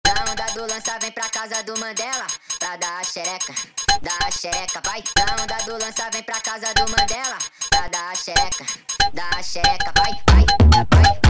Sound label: Funk sped Up